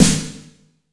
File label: AMBIENT S9, WET AMBIENCE; Masterbits - Soniq Elements